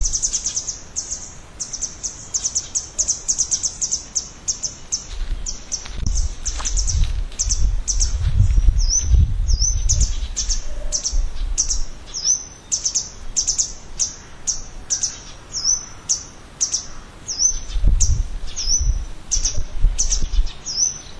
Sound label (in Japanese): sekirei